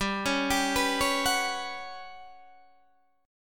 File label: G Major 7th Flat 5th, Guitar Standard; GM7b5 arpeggiated; 15,16,17,16,14,14